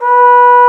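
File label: Trombone mf B3; Digital Sound Factory Virtuoso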